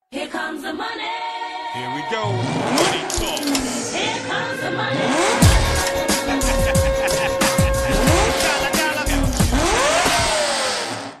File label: lambo